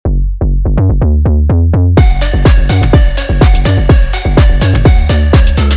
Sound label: Bass House